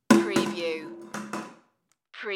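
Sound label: Tupperware Drop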